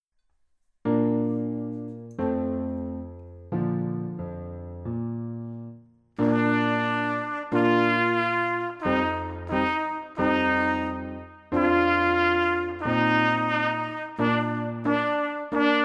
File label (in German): Trompetenfibel Zwei Stimmen